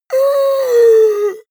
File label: monster inhale; kreaturen